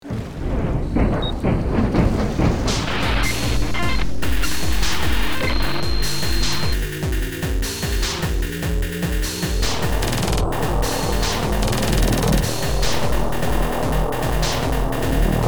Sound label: Startup